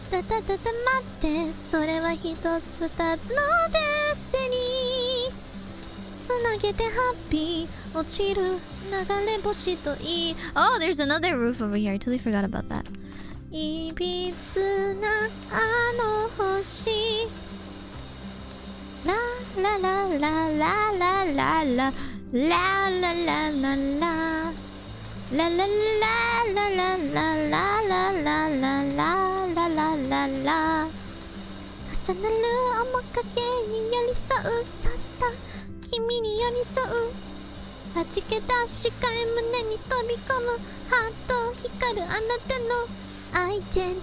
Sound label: Singing; IRySinging